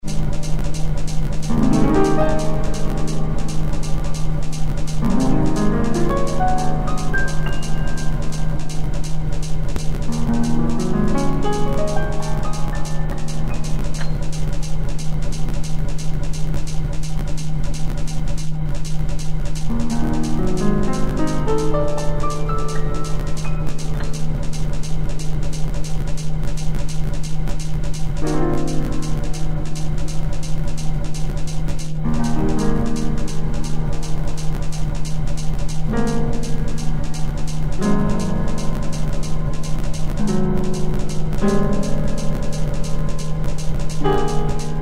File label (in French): piano industriel lent; arnaud-romet; free-fruits